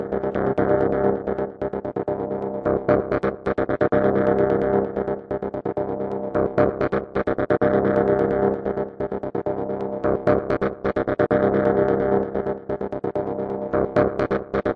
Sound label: 130 psytrance-panning-arpeggios, synth and electronic loops